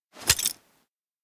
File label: hand over; anemoi パッケージ版 1.1